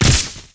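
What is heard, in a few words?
elec shot, attack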